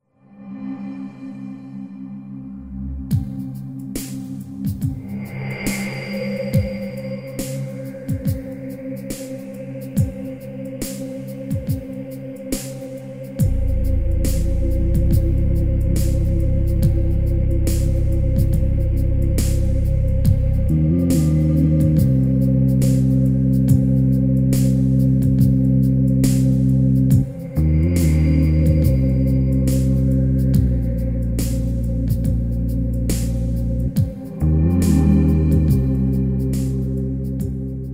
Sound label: atmosphere